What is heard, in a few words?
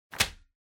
Flyer Put Down